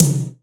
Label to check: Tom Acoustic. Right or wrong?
Right